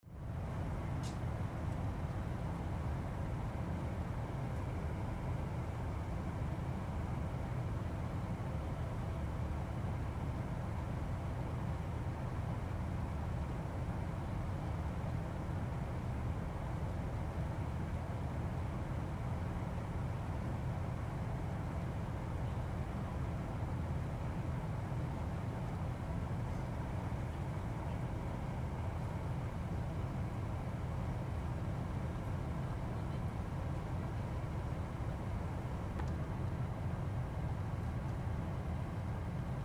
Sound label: 747 Jet Int Descendin